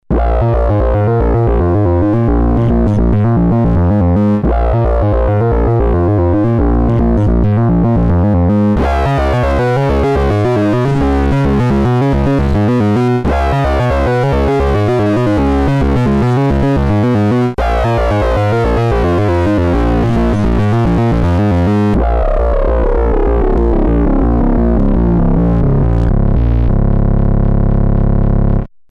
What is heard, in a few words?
fm sync; droid3